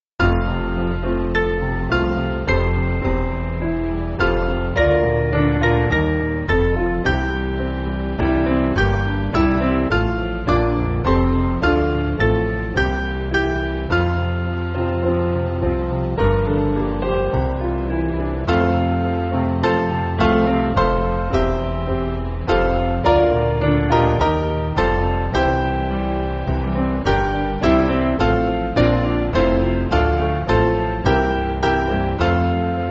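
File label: Piano, Music Backing; Mainly Piano (CM)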